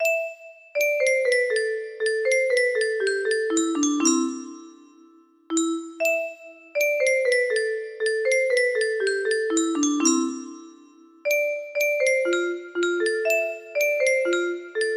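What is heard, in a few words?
60bpm, music box melody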